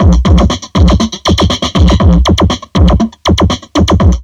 Drum Loops Crazy Processed

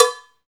CowBells

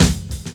SD, dance drums hip

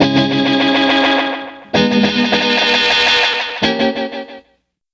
Dub Guitar; Electronic Point Blank

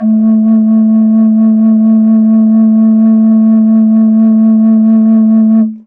moc a2, MOCENO BASSFLUTE; PS-52 AIFF WORLD INSTR 2; WOODWIND AND BRASS

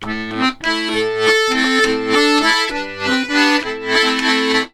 Complete Accordions; USB Soundscan vol.40; AKAI

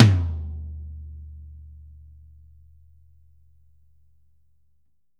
Drums CLOSE; Big Fish Audio; Ross Garfield Drums VOL-2; SHILOH KIT

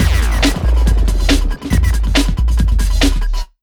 140, Big Beat Drumloops; USB Soundscan vol.25; AKAI

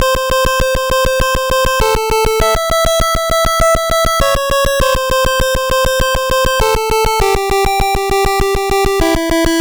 NES Synth, chiptune; Sonic Foundry; Nintendo Entertainment System

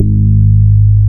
BAS MKS-50; Best Service Gigapack 2

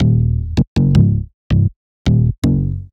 Punch Bass; Sonic Foundry (Sony Creative Software); Knutrix Drum & Bass

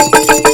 RHYTHM, Fairlight CMI, PERCUSN

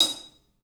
club hat; 3D Sound; 3D Drums

